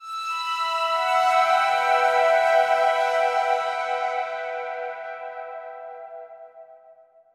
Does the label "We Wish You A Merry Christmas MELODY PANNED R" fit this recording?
No